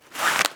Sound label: Rope Pull